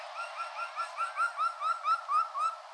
Speothos venaticus whistle

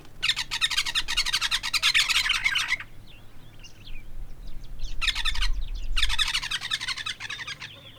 Bubalornis niger

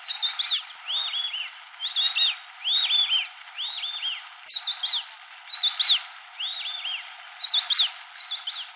Dendrocygna viduata flock

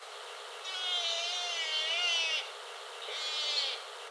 Oreamnos americanus

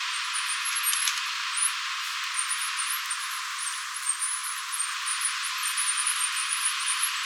Nasua nasua group

